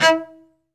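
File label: SOLO cello, Strings; ILIO; Synclavier Strings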